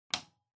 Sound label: Light Switch-Rocker (Wall-Mid)